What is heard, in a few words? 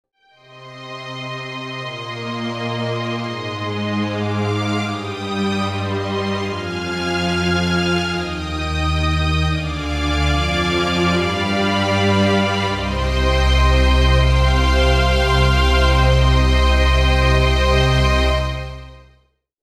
Strings